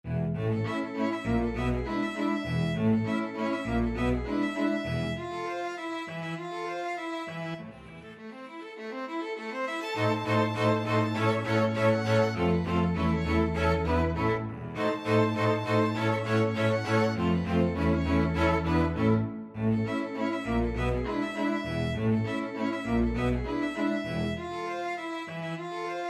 flexible mixed ensemble 6 players

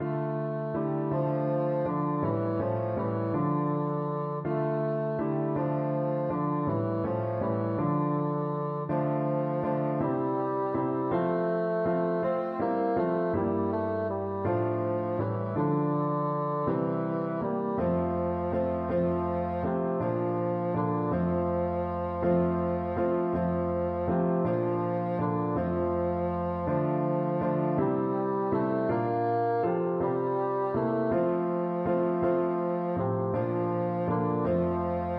we three kings, bassoon

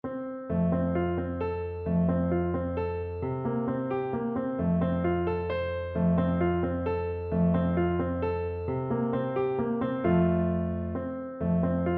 waltz facile, piano; dorn

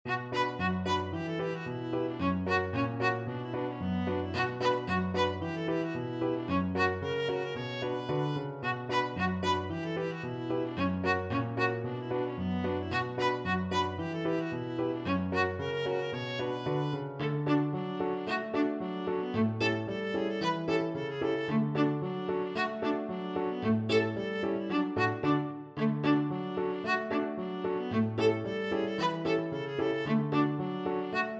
polkka viola

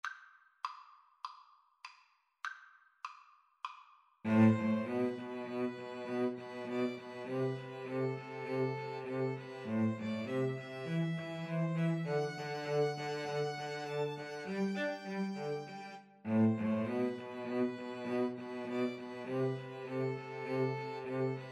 string trio

